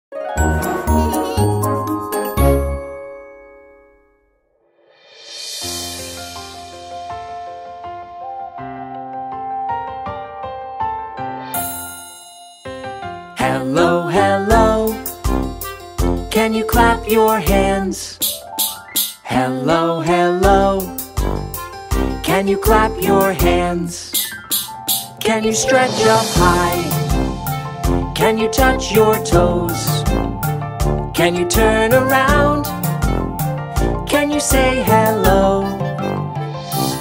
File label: Hello Hello Kids Song Nursery Rhymes